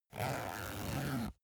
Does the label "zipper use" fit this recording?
Yes